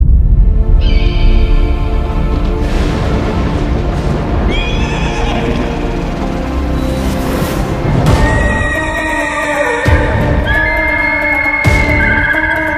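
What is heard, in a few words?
Title background music; Kanguva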